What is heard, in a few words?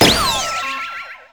Confetti; Levels Hub Restaurant 02 Act Stage 2